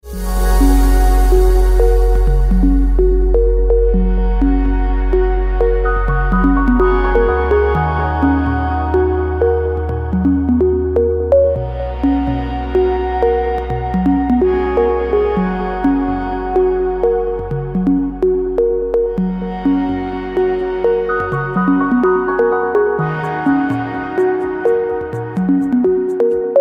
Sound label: chillout instrumental ringtone